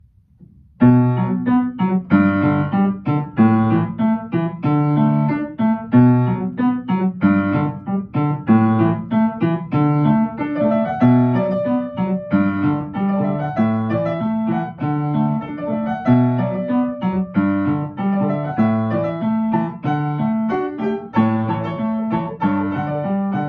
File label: piano